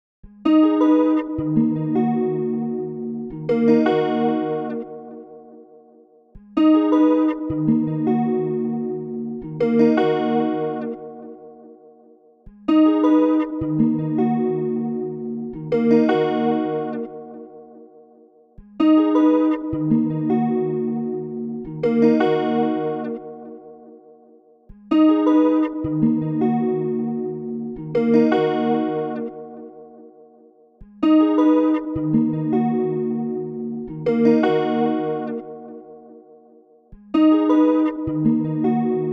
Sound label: 157 BPM D# Min Guitar Chords, melody loop stem; Loop Stems; Cymatics; 2022 Melody Collection - Various; Bellagio